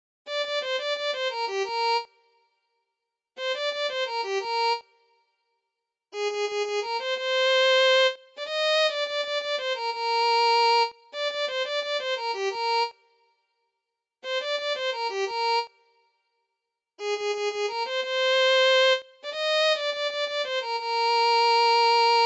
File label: Violin